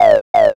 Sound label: crow